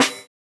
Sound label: Snare, jungle breaks drum sounds